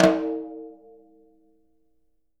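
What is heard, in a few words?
jazz snares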